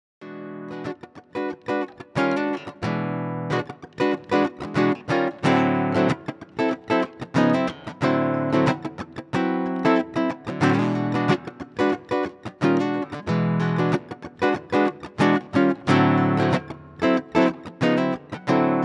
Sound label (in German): puls guitar